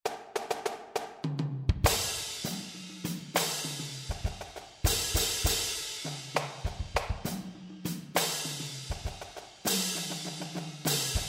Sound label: 100BPM, drumline